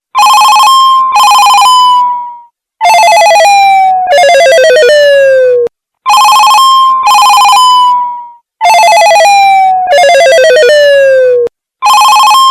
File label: crank ringtone